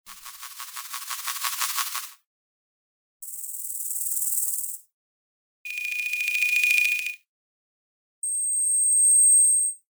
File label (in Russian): 4 varianta proleta sushhestv multjashnyh